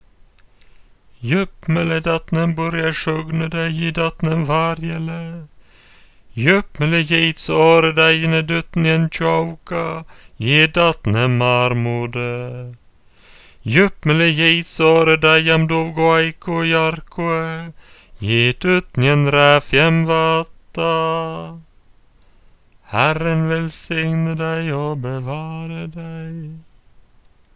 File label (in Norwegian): velsignelsen messetone; saemien åålmege; liturgier